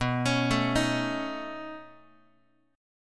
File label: B 9th B down Guitar; B9 arpeggiated; JGuitar